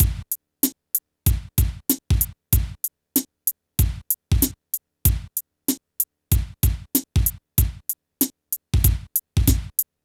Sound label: PHATT BEA, Hip Hop